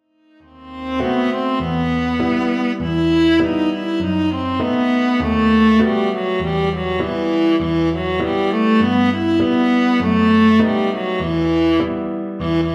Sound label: vla kl